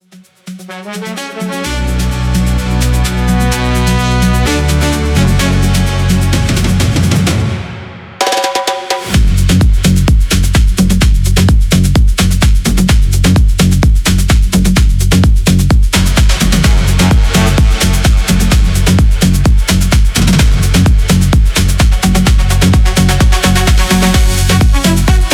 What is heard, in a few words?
DRUM PALM